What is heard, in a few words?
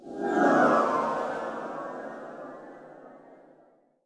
CHARM CAST